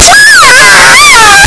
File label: pikachu cry; pokeyellow